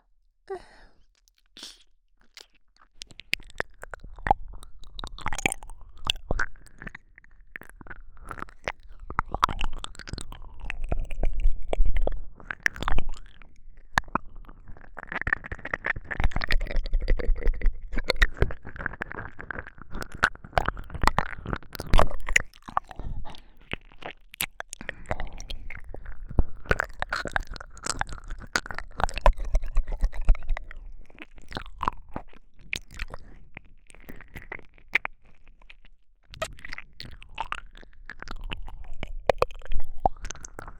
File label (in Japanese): 黄金の耳舐め